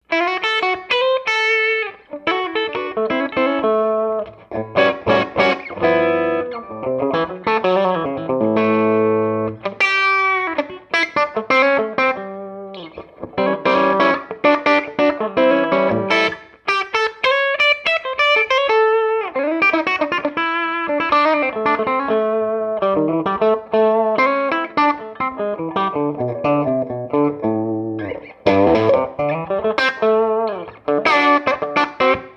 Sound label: wide range bridge clean; lollar